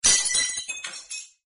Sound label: ice mine sound; Arc of Templar